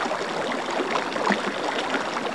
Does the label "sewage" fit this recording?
Yes